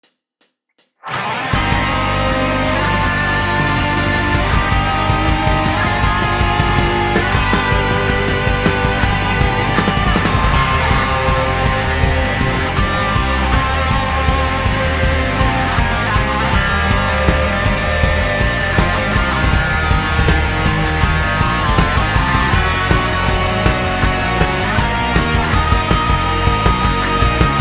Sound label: Rock Cover; Mario & Luigi - Paper Jam